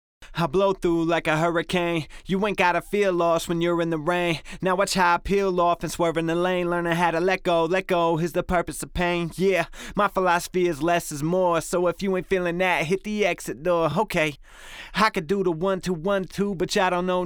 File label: compression